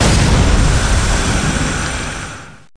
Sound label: player antidote